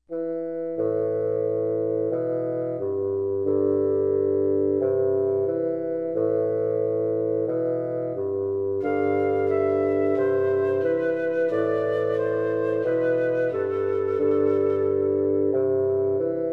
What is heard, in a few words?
hymn with organ; Simeons hymn